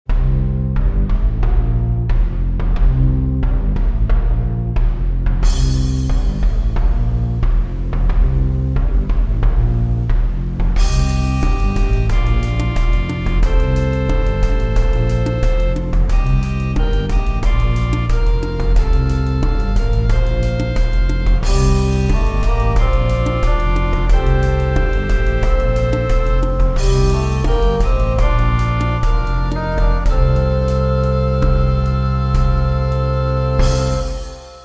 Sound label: distortion